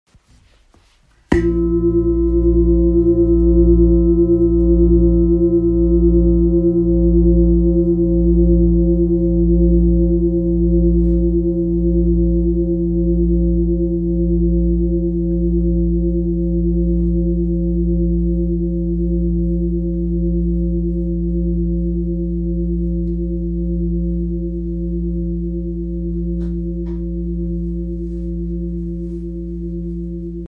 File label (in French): Gros bol bengale mi 9.47kg